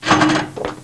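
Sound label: letter box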